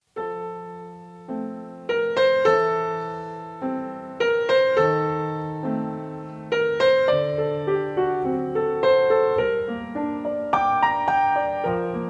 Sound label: Piano